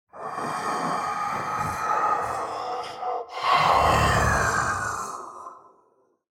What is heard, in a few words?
Demon king lord breathing